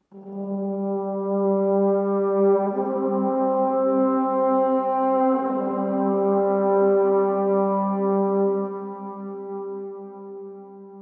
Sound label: space, ambient; Factorio - Space Age